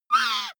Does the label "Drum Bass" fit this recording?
No